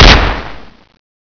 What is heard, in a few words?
Colt shoot